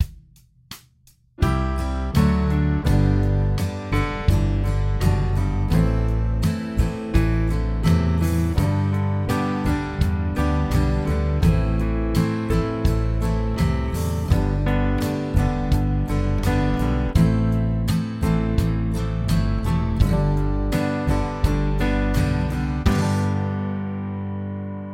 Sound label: major key chord singles; harmony